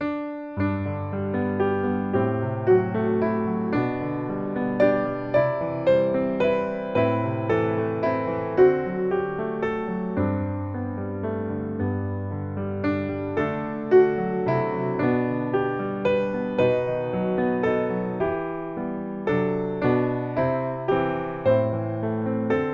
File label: piano